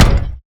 Larger Back; Monster Jam Steel Titans

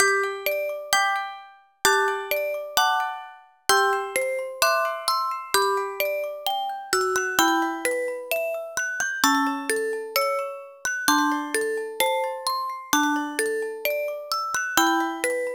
Ending; Princess Debut; Nintendo DS

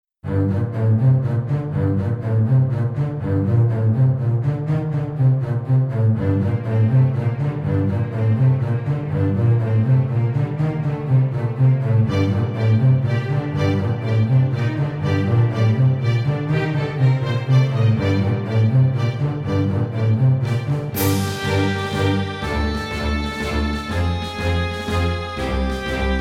Tension; arthurs quest battle for the kingdom

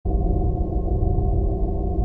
Walk; starbites

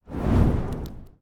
torch lighting up

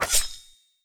Attack; Racaille

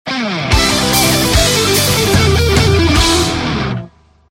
Jingle Fast; the muscle hustle; android ios

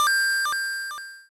Character Item Get

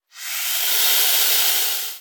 Rattle Snake; gamerip

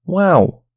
Chorus surprise

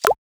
Grab

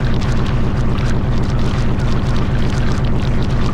Bulging Flying Loop; Hollow Knight

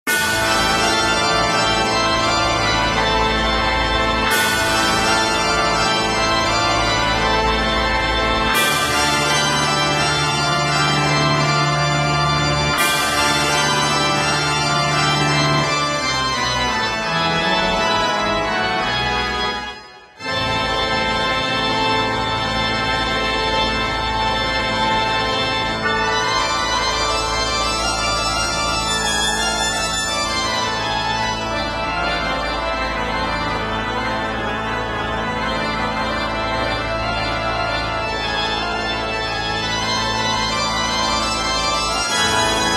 Final Boss RPG; menherafflesia flowering abyss; android

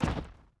Big Man Walk

